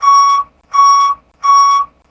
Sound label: backing alert